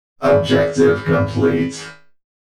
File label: objective complete; Video Game Announcer; DROID